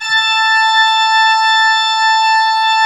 A4, Cathedral Organ; ILIO; Propeller Islands Cathedral Organ

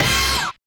RETRO HIT; Spectrasonic Distorted Reality 2

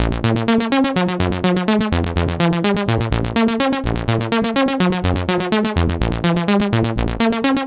dirty progression Ab 125, synth loop; Zero-G; Soundclash; Nu Dance Electro

